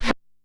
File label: RM1X Drum Anlg Kit Reverse Snare; Yamaha RM1X